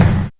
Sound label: natural bass drum single hit F sharp key